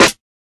old school short reverb snare drum sound a key, snare tuned to the A note